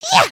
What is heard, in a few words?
excited, emotes